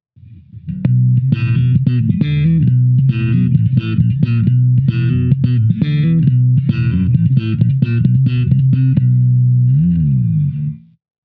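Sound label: rock bass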